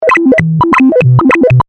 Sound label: Communication Beep, Scifi, Communication, Beeps, Vintage, Science, Fiction